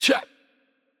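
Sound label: Attack Light, Italian; bloom minigame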